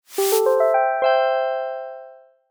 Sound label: logo playful ribbon